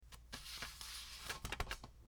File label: Papers shuffling